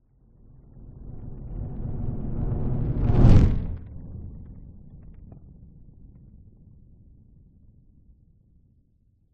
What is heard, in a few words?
nature fireball pass by large designed